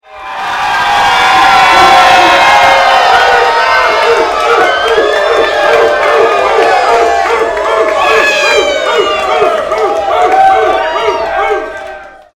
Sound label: applause